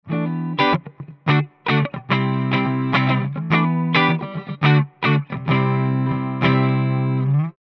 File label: split tone clean; Gitarreneinsteiger; Einsteiger Sets